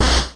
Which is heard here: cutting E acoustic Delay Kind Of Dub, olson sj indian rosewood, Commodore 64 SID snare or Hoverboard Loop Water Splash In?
Commodore 64 SID snare